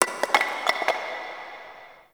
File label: Zariman Stone Debris; Warframe; The New War; Ambience